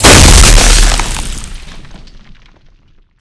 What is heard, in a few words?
tyrantmace skill exp